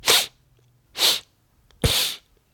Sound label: Sniff